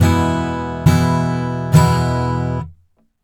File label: e chord